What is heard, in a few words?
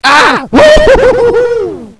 fall